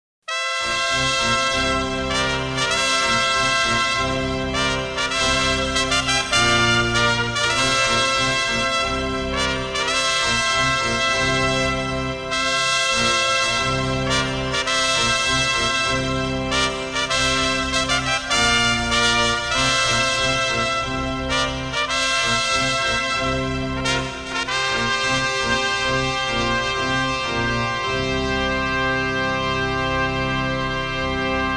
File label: fanfare ceremonial